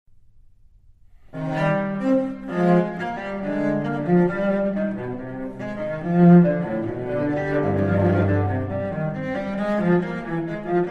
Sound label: Cello Suite In C Minor Gavotte; Cello Suite No5; BWV1011; Gavotte I II